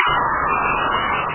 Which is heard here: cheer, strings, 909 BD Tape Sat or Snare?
cheer